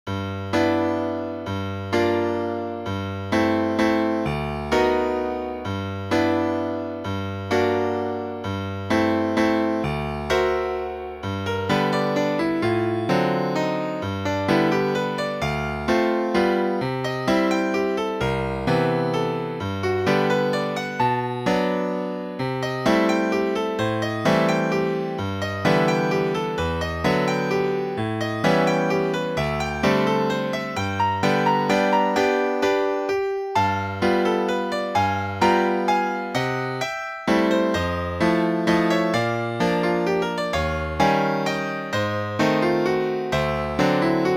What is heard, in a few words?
Blue Waltz